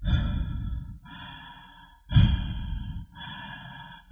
breathing, Drum loops

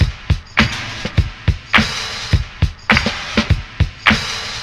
Drum loops Funky

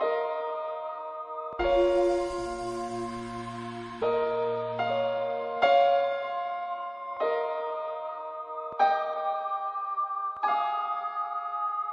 piano, sad loop

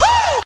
"woo", voice

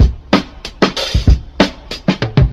sudden rolls, Funky Drum loops

